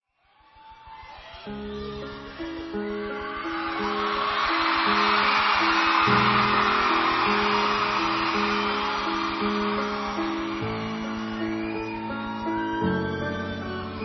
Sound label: unplugged